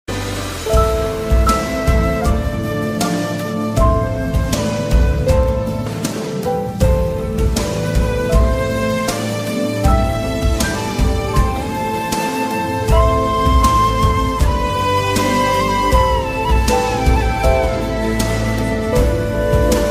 bgm ringtone tamil love ringtone

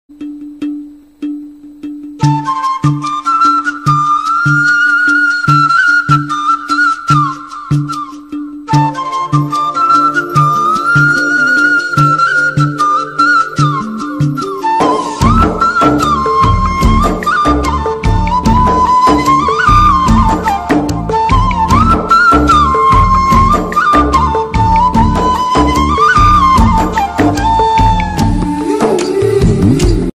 pournami flute bgm

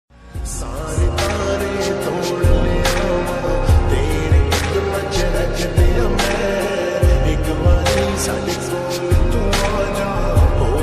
love ringtone romantic ringtone hindi ringtone

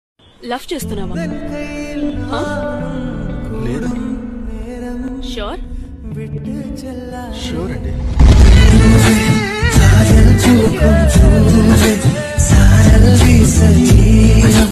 song tamil; movie bachelor

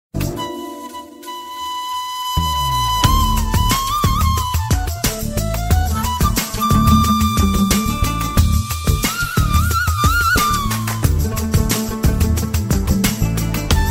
flute bgm ringtone song; kadhalar dhinam movie

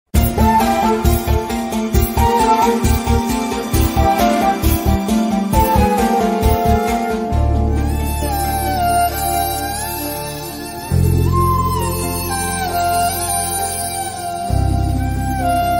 sad violin bgm; Bommarillu; emotional

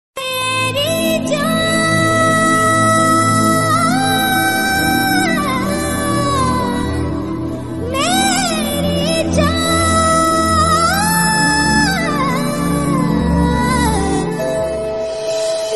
heart touching bgm ringtone; ekkadiki pothavu chinnavada

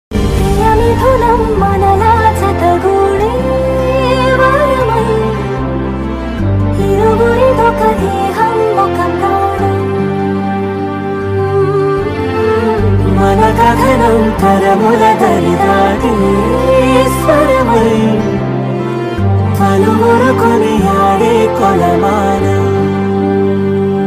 south bgm ringtone telugu bgm ringtone; priya midhunam; adipurush

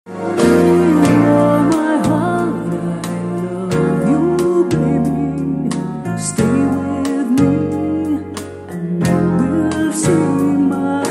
sweet love valentines day love ringtone

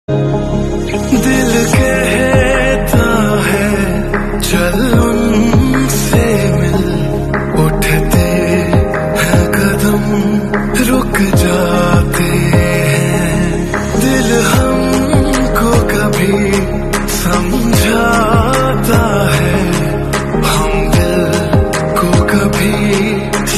love ringtone romantic ringtone

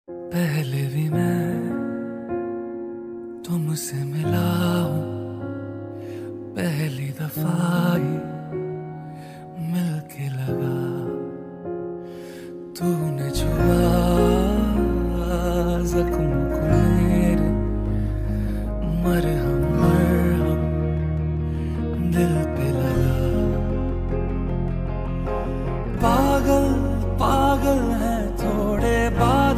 slowed reverb lofi remix ringtone love ringtone

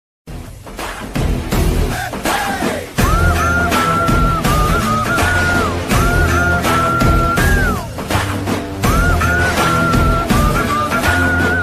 annaatthe movie best ringtone

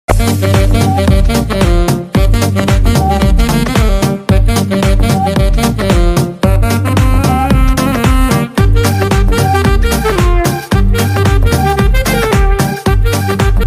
bgm ringtone, telugu ringing tones

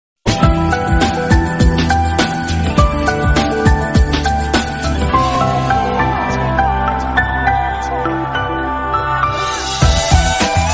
awarapan best romantic ringtone

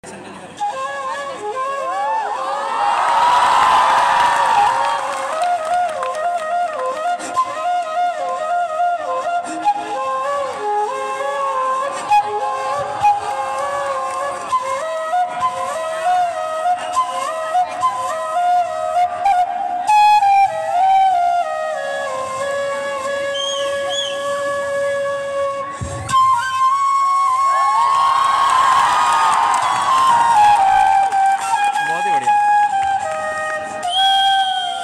flute music; by pce student at maharshtra times carnival

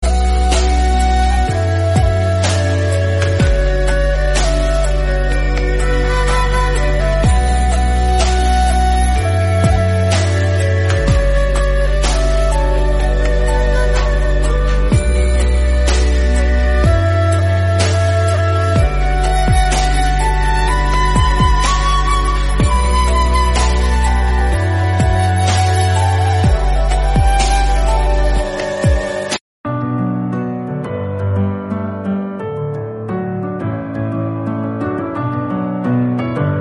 Instrumental; RHTDM

